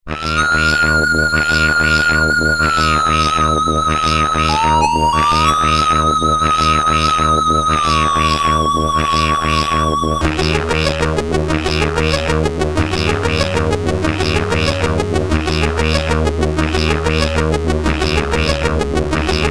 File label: SID Stereo; Osaka; commodore 64